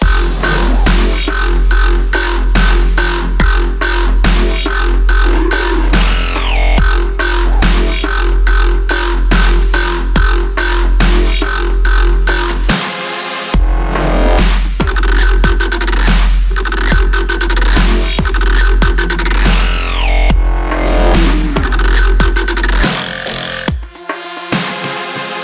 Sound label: Morse Code